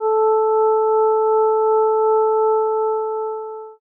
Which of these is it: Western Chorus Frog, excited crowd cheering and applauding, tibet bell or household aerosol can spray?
tibet bell